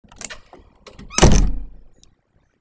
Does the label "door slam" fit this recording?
Yes